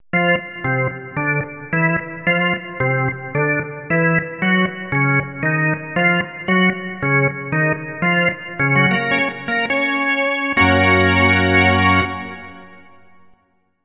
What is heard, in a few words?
Organ Teasers